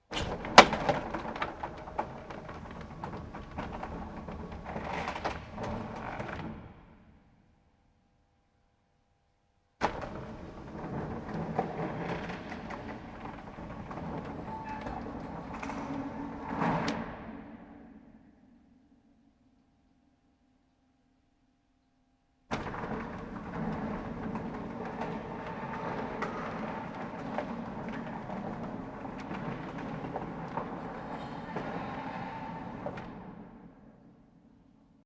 Industrial Mechanical Electric Door Large Close Perspective External Open Variations